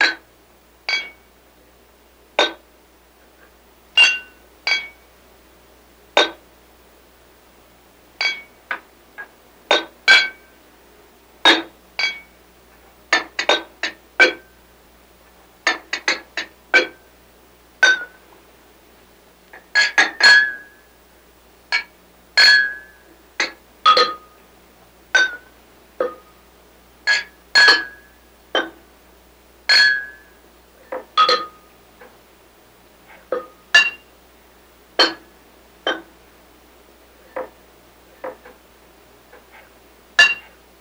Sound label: Bar Glass Clink Clank